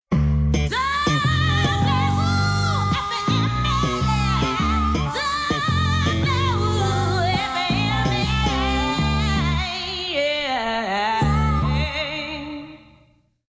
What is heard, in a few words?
Jingle